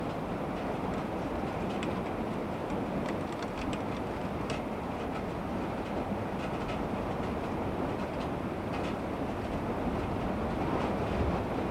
thunder

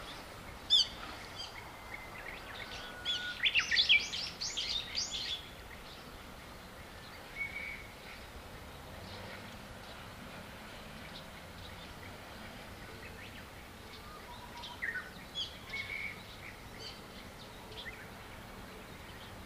birds bush warbler

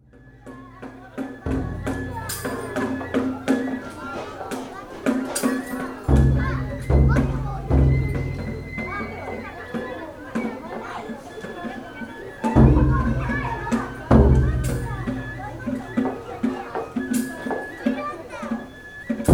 mikoshi taiko

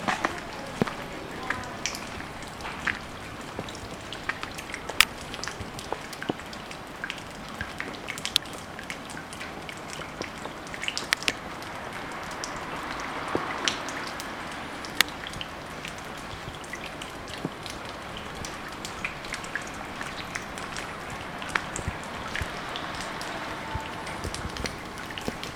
water drops

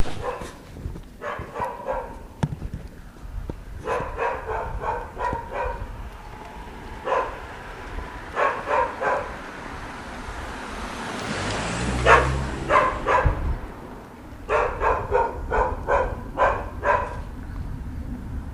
dog baubau

